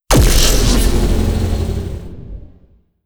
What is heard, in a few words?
neutron fire layer; apocalypse